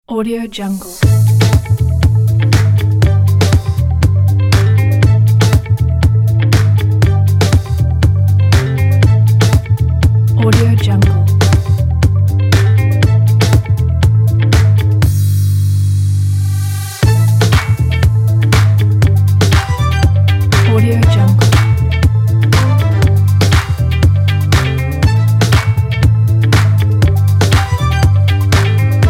upbeat disco funk

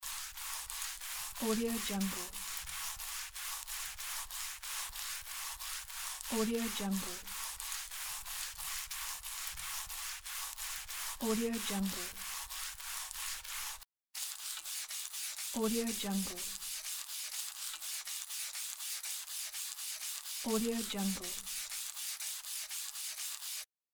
cleaning with wet sponge loop

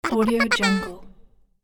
cartoon character celebrates

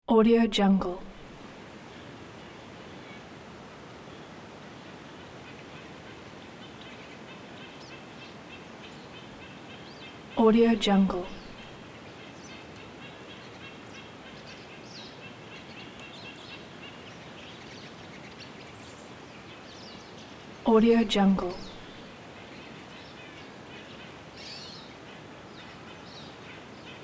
forest ambience

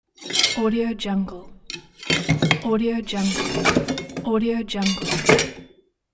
knife

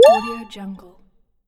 nice popup

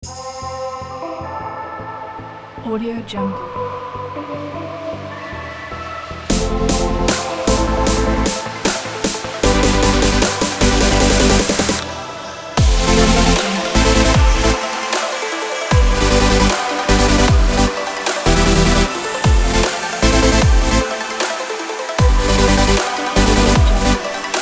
opener music kit; eliansproductions

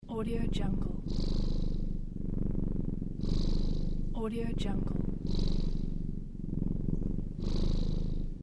cat purring contentedly

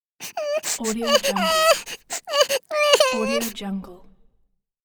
cartoon crying

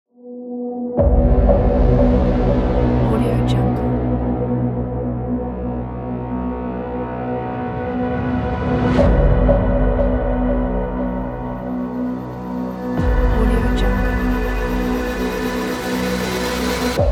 power trailer intro

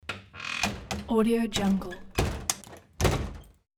heavy wooden door closes four times

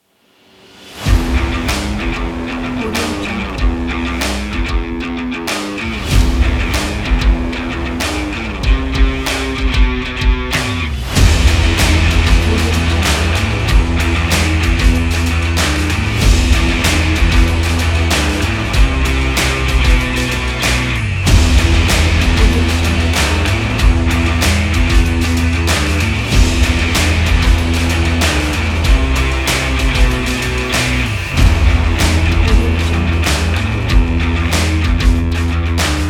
rock trailer

